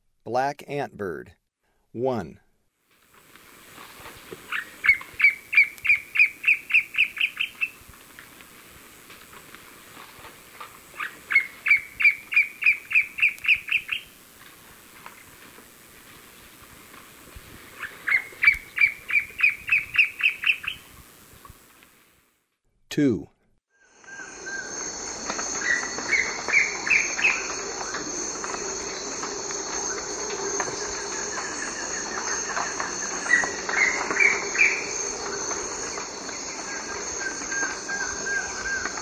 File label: Black Antbird, Eastern Lowlands, S. America